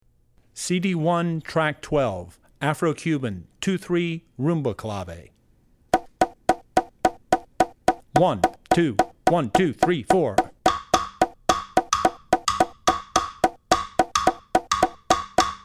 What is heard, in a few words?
3-2 Rumba Clave; CD1 Track12 2-3 Rumba Clave; Drummer's Bible Tracks